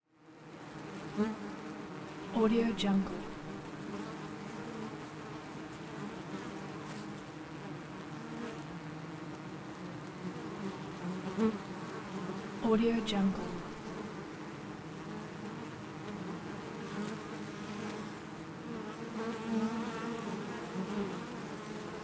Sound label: the beehive